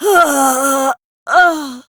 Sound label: Death, Barks; Kuva Lich; Persona B